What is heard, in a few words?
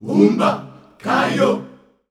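Rhythmic Chants, Voices Of Africa, 90 bpm; Umba Kayo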